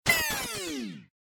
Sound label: maximum charge stop